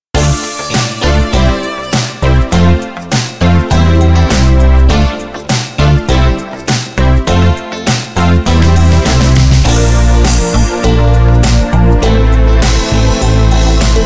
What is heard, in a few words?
longfunk